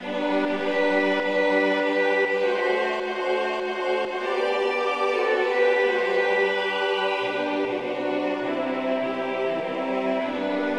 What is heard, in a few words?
polyinstru; La voix de Christ nous appelle; hymns